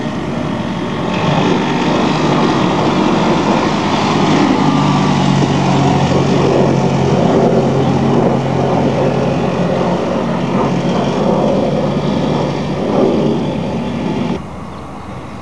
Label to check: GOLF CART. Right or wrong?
Right